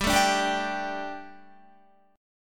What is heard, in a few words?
G Suspended 2nd G, down, Guitar; Gsus2; strummed